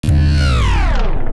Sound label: spell breake; classicro; sage